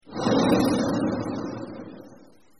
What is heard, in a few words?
oven burns; Delicious Emilys Wonder Wedding